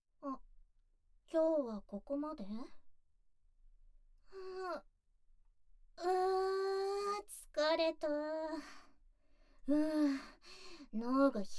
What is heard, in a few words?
Off SE, ASMR